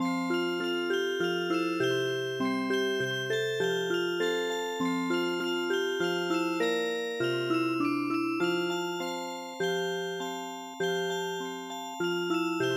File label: Music Box, traditional